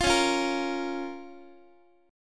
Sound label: Db 6th, Guitar; Db6 strummed; JGuitar